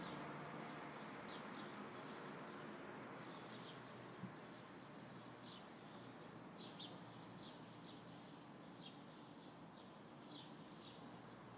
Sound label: 60 seconds of morning birds ambience